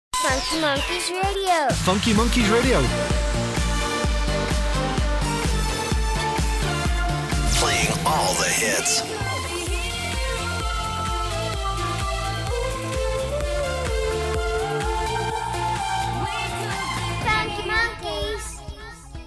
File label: Radio Music ID; Funky Monkeys Radio